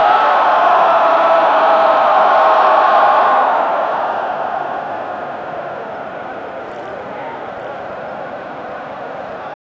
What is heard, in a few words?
crowd chanting and singin; atmosphere